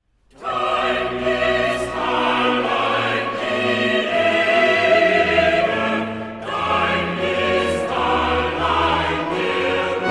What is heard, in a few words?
cadences